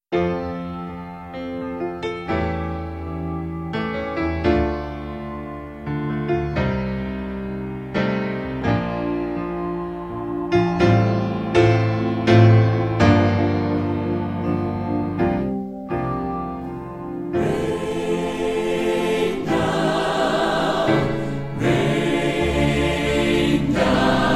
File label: Christian Hymn